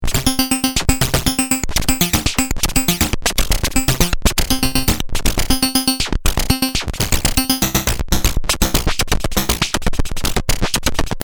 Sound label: waldorf pulse